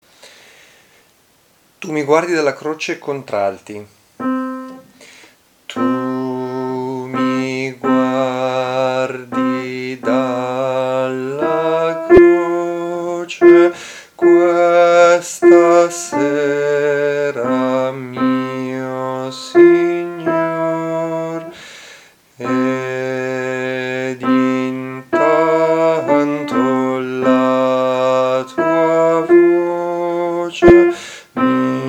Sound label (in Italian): contralti; Tu mi guardi dalla croce